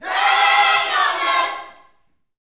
Vocal Gospel PHRASE

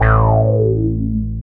bass

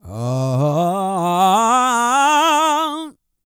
GOSPEL; Spectrasonics; Vocal Planet Vol.1 Gospel; akai

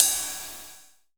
RIDE CY; E-Lab; X Static Goldmine 2; akai

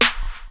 SNARE; E-Lab; X Static Goldmine 2; akai

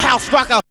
VOX

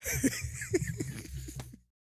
LAUGH, Vocal Jazz Fx

